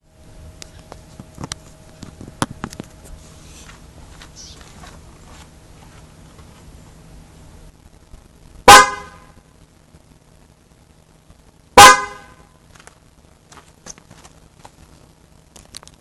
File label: RX350 HORN SOUND